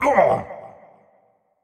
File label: Marv Hurt